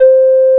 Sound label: OB8 blow C4, OBERHEIM OB8; Big Fish Audio; First Call Vintage Keyboards